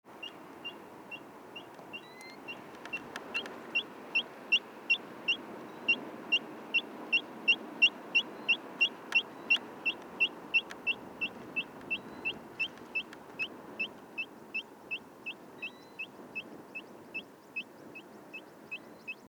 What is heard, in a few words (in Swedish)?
Fjallpipare